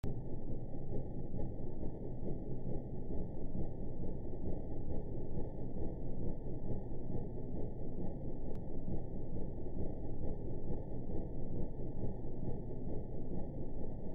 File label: construction